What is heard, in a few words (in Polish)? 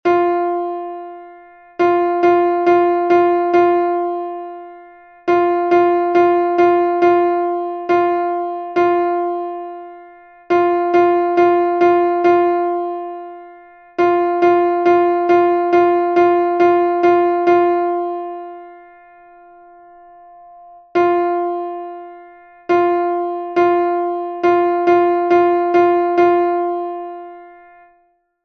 ćwiczenie rytmiczne z szesnastkami